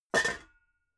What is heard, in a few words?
pots rattle